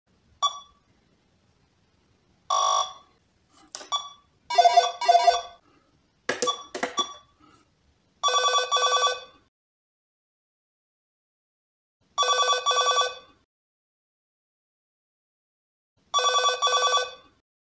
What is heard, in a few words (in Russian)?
zvuki domofona cifral